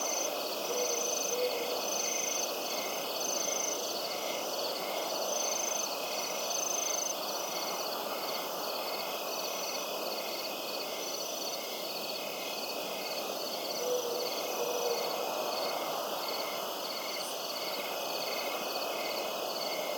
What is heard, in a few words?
Ambience Night